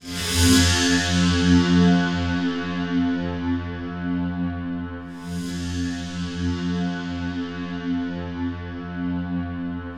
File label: MONOCHORD; Bolder Sounds - Granular